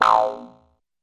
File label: cartoon boing poing